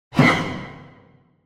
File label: Symphonic Hit Surprise Chord - Higher; Hollywood Edge; sound designer's toolkit v2